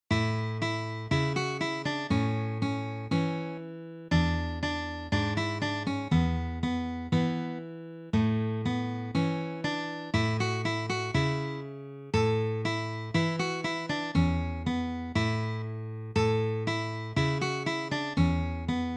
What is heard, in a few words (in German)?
Gitarre; traditional